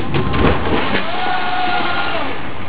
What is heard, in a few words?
Metro open